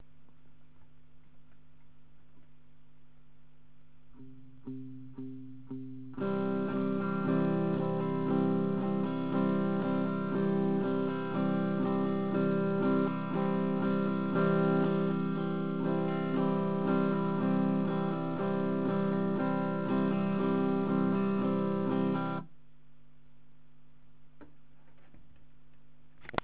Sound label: strumming